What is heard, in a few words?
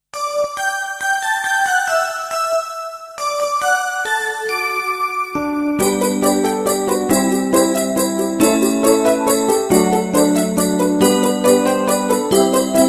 Karaoke Instrumental